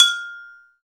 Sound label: AGOGO; Club-50 - Foundations Roland; CowBel+Metl; Agogos